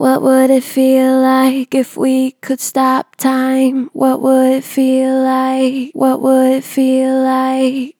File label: Gentle Vibrato "What would it feel like", Vocals, Natural Vibrato Gentle; Harmor Vocals